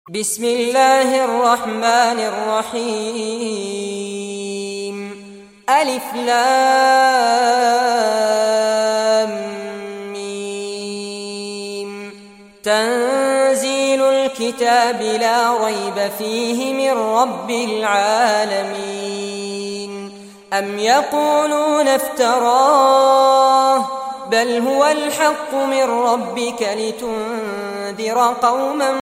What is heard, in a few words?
quran islamic